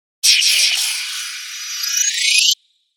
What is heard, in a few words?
hit airy; 5.1 STEREO MIX; Blastwave